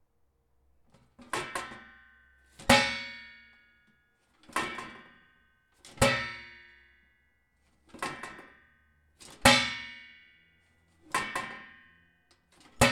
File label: Metal Trashcan Open And Close